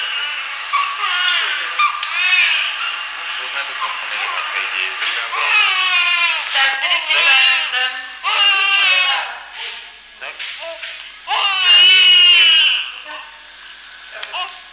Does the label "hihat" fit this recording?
No